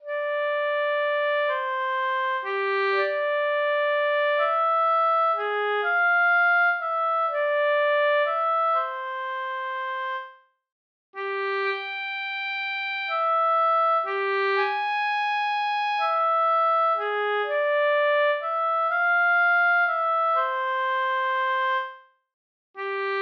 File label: oboe